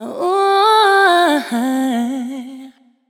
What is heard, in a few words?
vocal adlib ooh hi soulful Dm